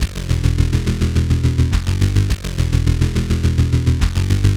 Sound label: Synth Bass